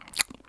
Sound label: Eats; Picnicboat